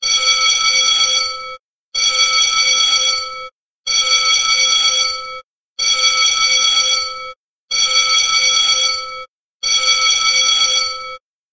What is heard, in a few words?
Intermittent School Bell